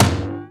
FX snare; E-MU Formula 4000 Series Vol. 2; OrbitPercMaster

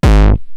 Roland TR-909 BD; BD Selection

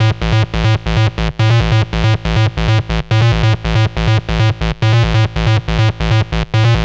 Techno Synth

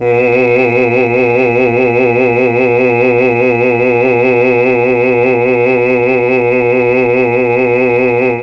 B1 CHAMB MAL, Mellotron, MALE VC; Mike Pinder - Mellotron Archive CD; CHMB MALE VC

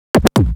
Scratch Fills 140BPM

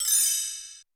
Roland U220 Electric Set, Drum Machines

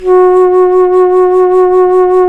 bass flute, vib; Roland L-CDX-03 Disk 1

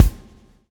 SWNG

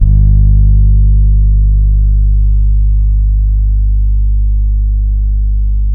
SAMBA, Bass

